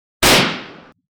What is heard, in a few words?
Gun shootinng